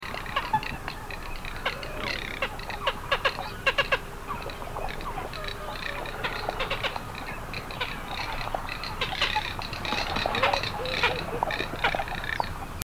chirok treskunok brachnye, birds

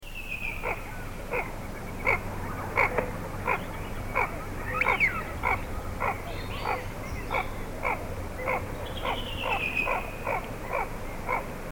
hohlataya chernet, birds

